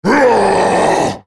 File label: ultimate effort; Volibear